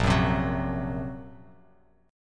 A sharp Suspended 2nd Sharp 5th A sharp, Bass Standard; A#sus2#5 strummed; JGuitar